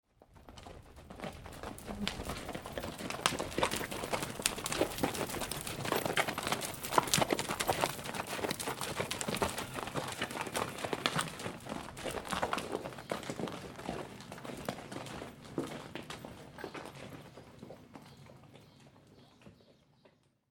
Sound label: Horse hooves / horse hoofs clatter on dry gravel as two horses; walk past lazily; Horse Sound Effects